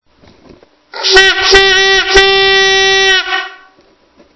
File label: cheering horn